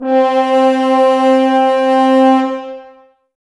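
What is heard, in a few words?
Brass French Horns C; Sample CDs; Miroslav Vitous; Woodwind Brass Ensemble; F.Horns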